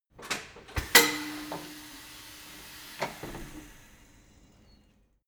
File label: tire changing machine hydraulic arm separator in